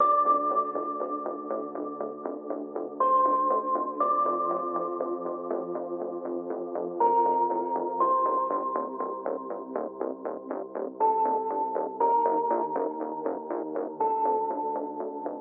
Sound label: pause, music